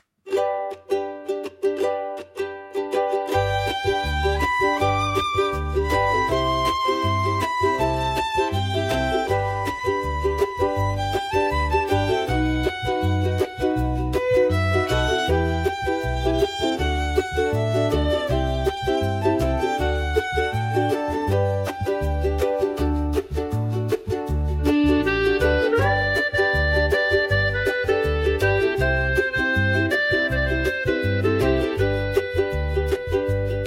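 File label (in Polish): Muzyka Hawajska; Mele Hawaii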